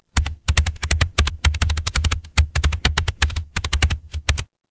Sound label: office keyboard